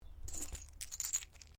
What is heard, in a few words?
COUNTERS PLASTIC GAME PICKUP; Casino Sound Effects